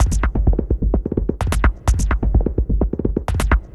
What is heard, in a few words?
Percussive